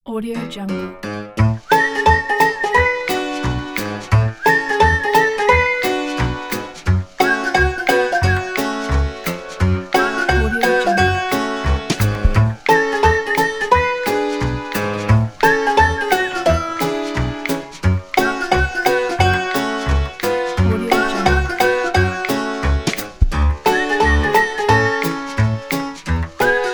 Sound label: happy honky tonk